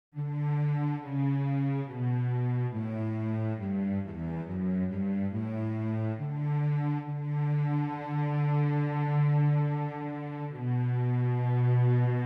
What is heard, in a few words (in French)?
VIOLONCELLE; Psaume88